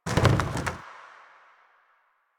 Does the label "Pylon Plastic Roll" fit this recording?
Yes